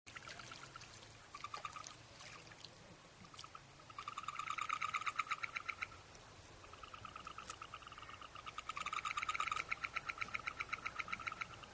Euphlyctis cyanophlyctis

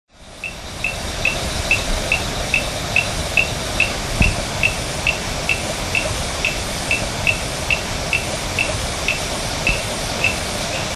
Boophis albipunctatus

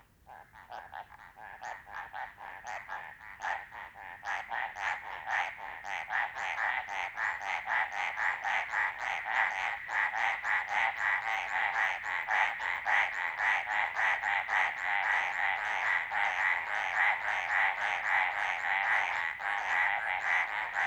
Hyla eximia

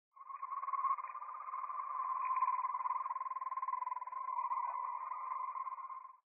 Scaphiophryne madagascariensis

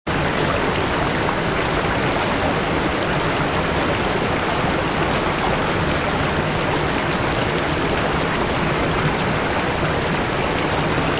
Hylodes asper

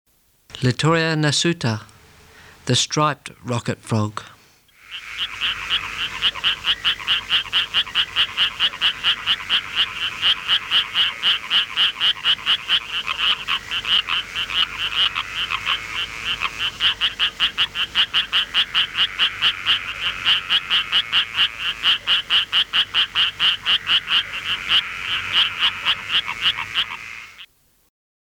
Litoria nasuta

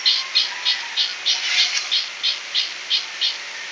Ranitomeya benedicta

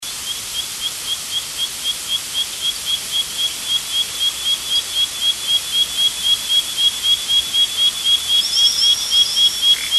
Boophis schuboeae

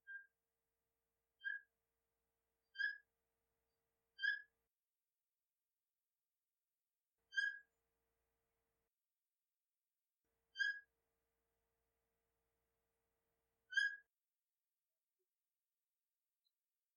Theloderma asperum

